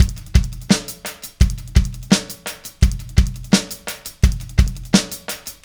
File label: Static Beat